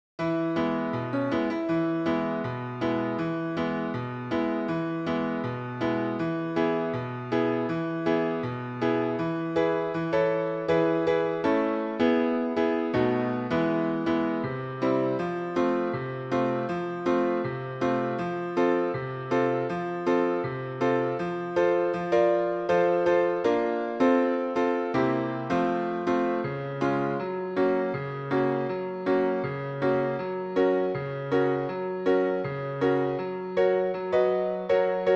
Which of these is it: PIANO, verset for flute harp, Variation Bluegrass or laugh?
PIANO